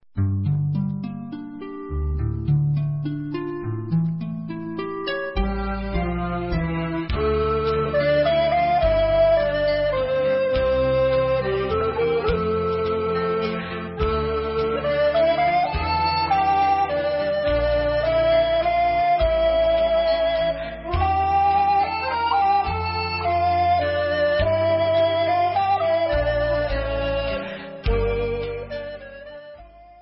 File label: Instrumental